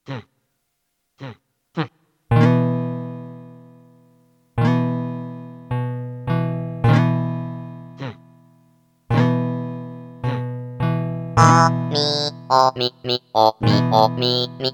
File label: Turkish Song; animal forest totakeke music 2006